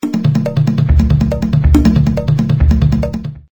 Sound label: chaos hand drums more bass